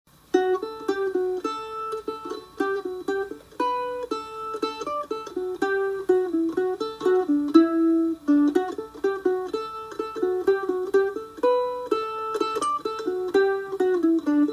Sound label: Schottishe a Virmoux Mandolin; crown russe